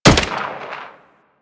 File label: Rolling Drone Turret Fire